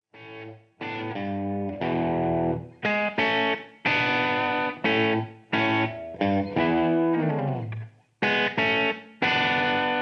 Mp3 Backing Track

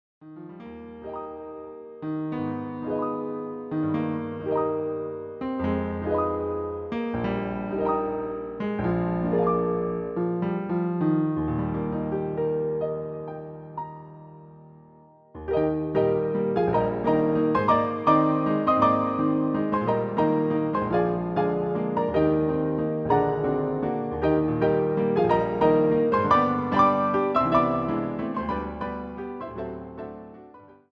piano roll

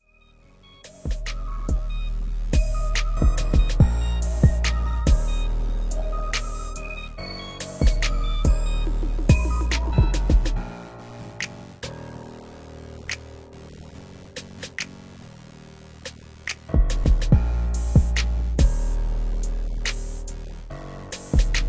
71BPM

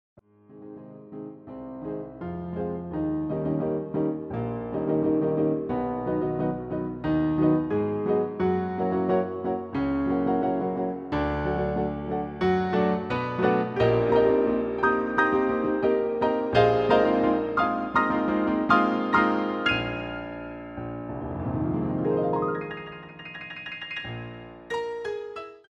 Piano Roll

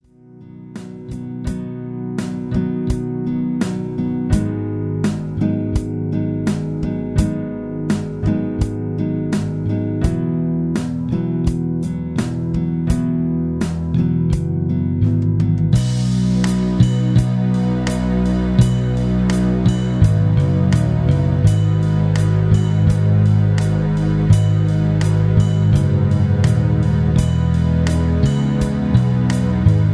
intrumental

